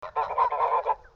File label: goose